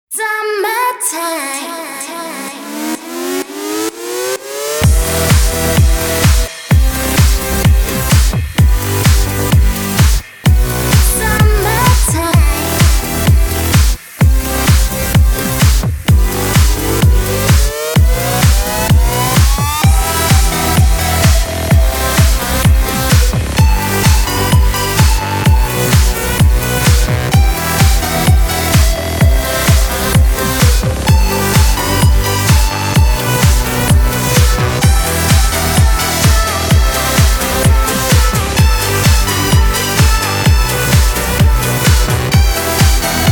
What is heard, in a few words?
electro